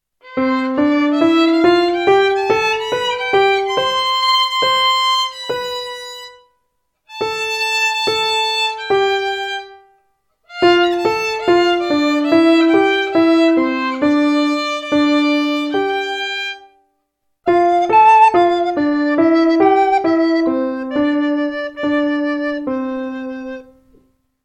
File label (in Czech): Klavir Housle Klavir Sopran Fletna